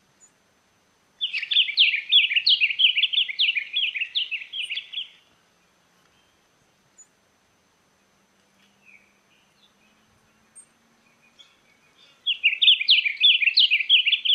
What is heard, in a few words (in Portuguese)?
Canto do sanhacu frade